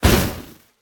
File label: hit; smite; baron samedi